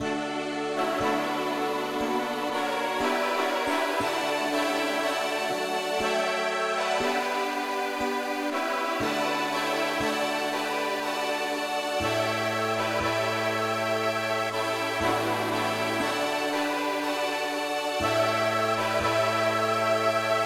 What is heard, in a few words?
pop No Voc